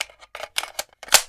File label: Automatic Reload